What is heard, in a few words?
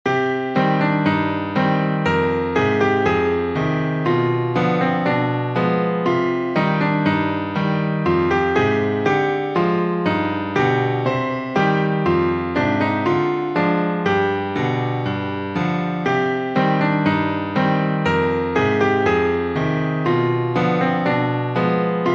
fake folk dance